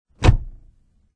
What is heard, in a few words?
door close; WMBonusXP